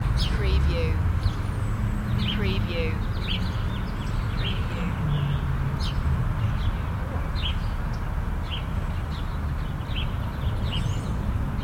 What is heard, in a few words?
City Park Background Short